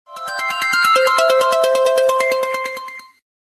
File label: Samsung Galaxy Note 9; Klingelton